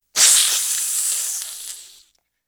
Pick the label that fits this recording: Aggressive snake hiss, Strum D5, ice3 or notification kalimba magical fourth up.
Aggressive snake hiss